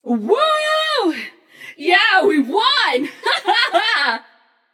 yay passion; chaac

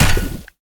auto hit; smite; vulcan

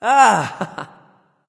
nv sigh; smite; voicepack; xbalanque

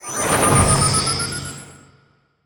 intro flyby; smite; khepri

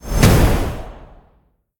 wind hit; smite; tiamat

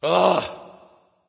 "ugh"; smite; voicepack; ullr

